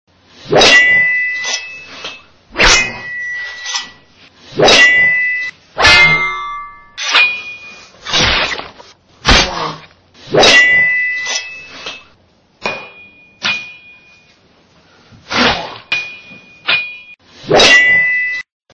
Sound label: sword; reversevape